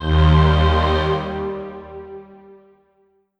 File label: epic futuristic movie accent; the legend of shadow mask of the force